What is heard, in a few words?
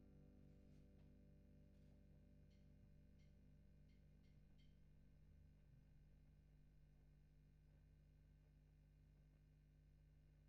GTR B